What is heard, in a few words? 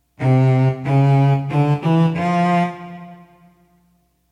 melody recognition